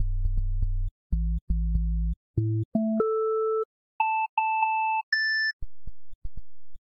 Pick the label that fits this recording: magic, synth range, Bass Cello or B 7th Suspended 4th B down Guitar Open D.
synth range